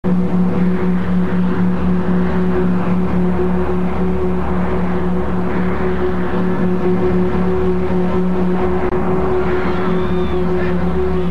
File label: UFO Landing